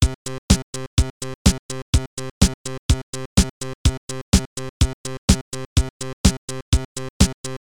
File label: beat